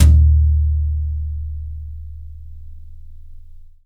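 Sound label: Yamaha 14X14, brush, drums; Big Fish Audio; Ross Garfield Drums VOL-2; T-YAM BRUSH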